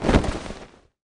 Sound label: jump